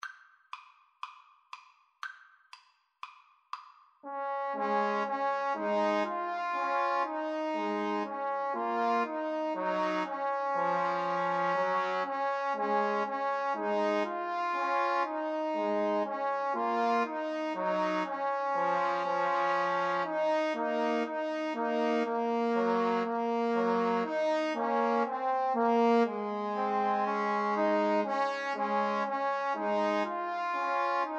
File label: trombone trio